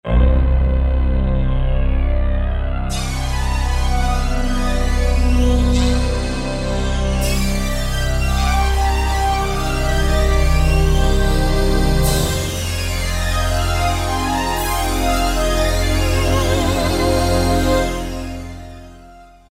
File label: Ray, Yamaha TG500